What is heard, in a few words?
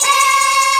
vox special